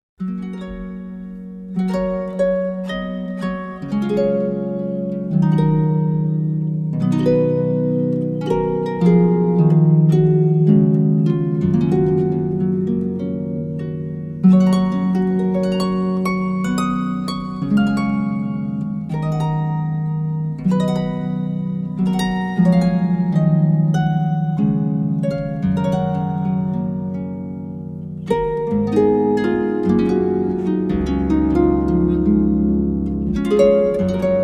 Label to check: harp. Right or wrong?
Right